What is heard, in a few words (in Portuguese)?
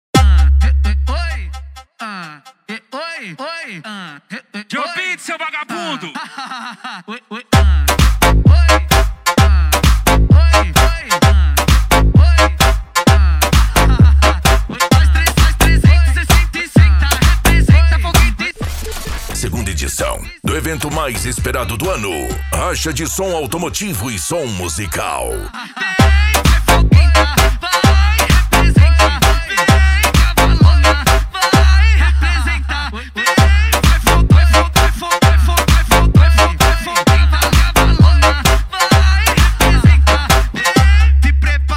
racha de som automotivo e som musical